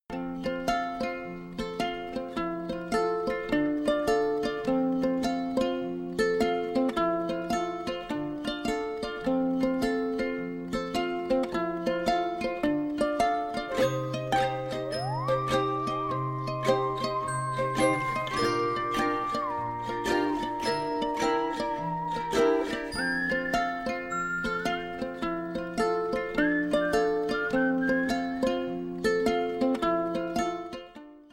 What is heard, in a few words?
quirky ukulele